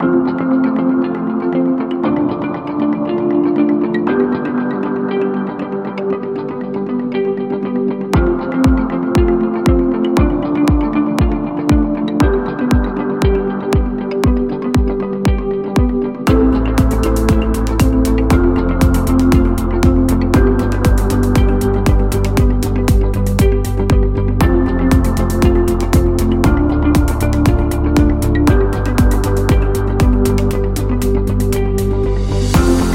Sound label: Back Ground Music